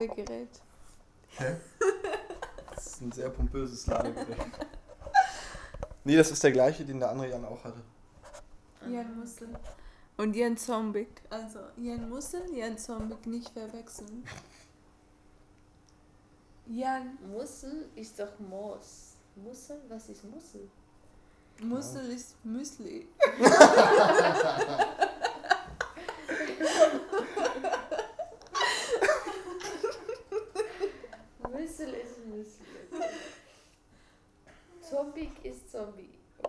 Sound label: Talking And Laughter